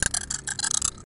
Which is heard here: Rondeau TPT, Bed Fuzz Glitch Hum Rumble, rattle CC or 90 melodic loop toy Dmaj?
rattle CC